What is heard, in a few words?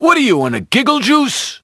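hit by car leg giggle; SHAR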